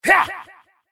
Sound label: attack; Mad Mage; Mob Voice